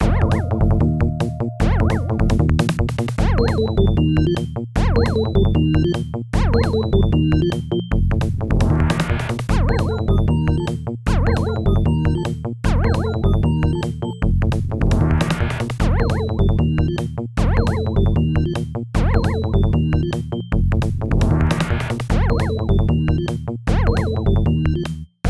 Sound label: Goal; super sprint; atari system 2; arcade